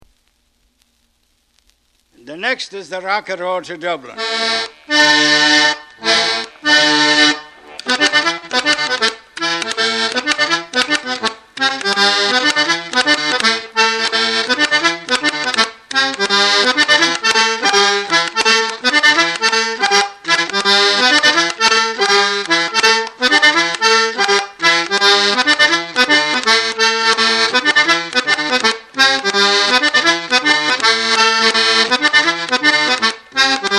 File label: Hop Jig; The Rocky Road to Dublin